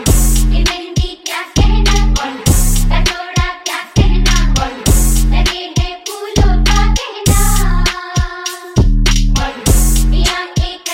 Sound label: Punjabi Cool Ringtone